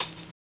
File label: RAGGA; Spectrasonics; Metamorphosis; RAGGAVYNL